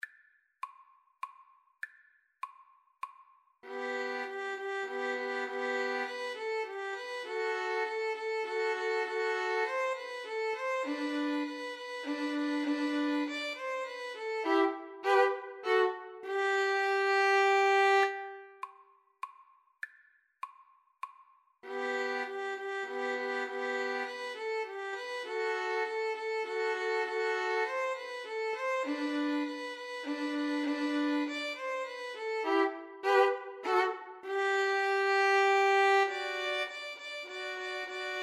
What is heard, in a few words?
fanfare, 2 Violins Cello; corrette